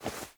Movement Foley A; Wisp

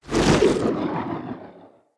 Casting Device Projectile Whizz By Heavy; Weapons; Player

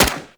SMG Shot; Warframe; Tenno; Sundial SMG; Weapons

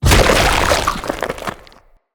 Corrupted Head Explode; Bromius; Foley

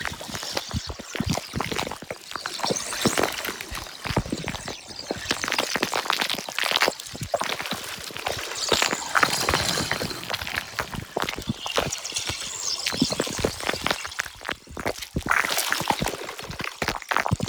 Ink Tendril Loop; Warframe; Shadowgrapher

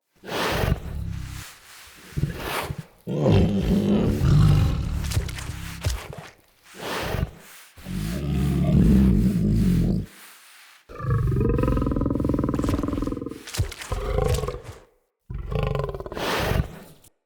Wolf Idle Petting Stand; Animals; Vocals

